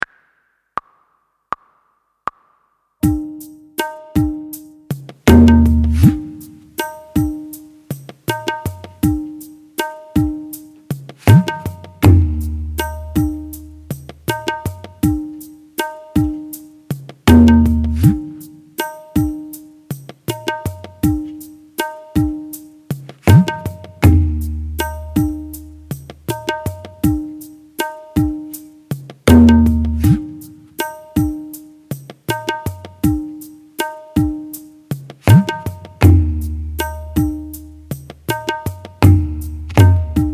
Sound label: three slides practice with tabla